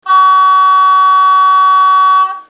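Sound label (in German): horn tief, Märklin V160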